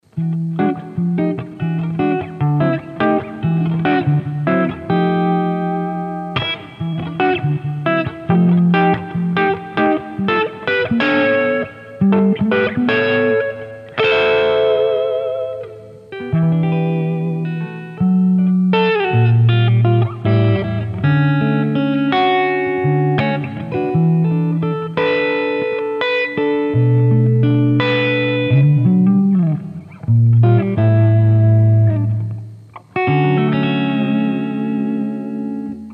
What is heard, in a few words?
Clean gain max; JFET